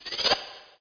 lethal strike vanish; hero mirage

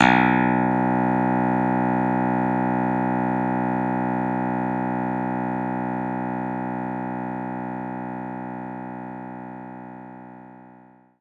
Clavinet B1

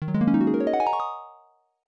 Route Start; paperboy; arcade

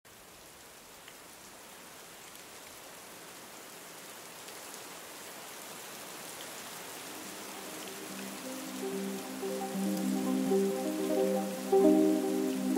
Rain In C Minor; Floor is Jelly

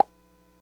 loading bar flower; Plants vs. Zombies

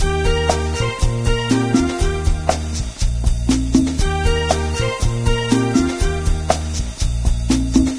Cafe Music; Diner Dash 2 Restaurant Rescue

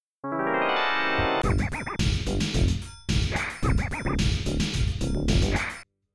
Monster Rap Tune; Class of 1812; Gottlieb; pinball; arcade

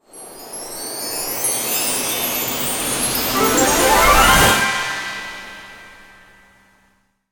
Map Scroll Progression; Candy Crush Saga

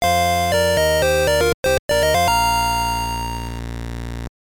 Jingle; Battle Space; gb

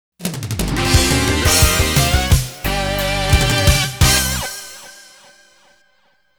Stage Clear; rockman x1 6 collection

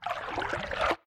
Footsteps Dwarf water; we are the dwarves gamerip 2016

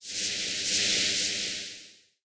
Powder

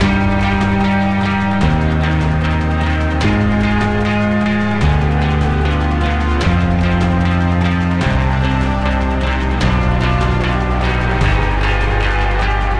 Boss Combat Winning; Griftlands

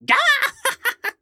Lunge; Collector

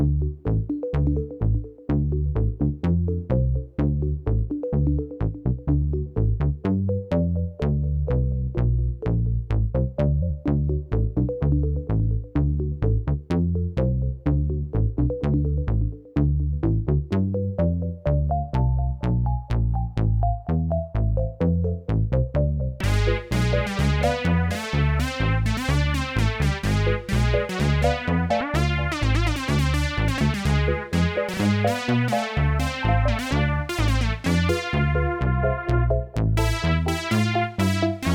Battle Layer Synth; Plants vs. Zombies Battle for Neighborville

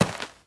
footstep gravel; 30 Days to Survive

